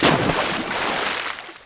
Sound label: water splash